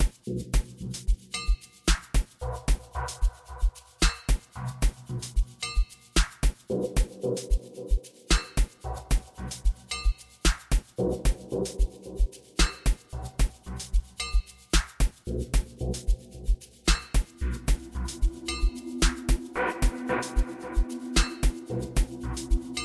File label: Gqom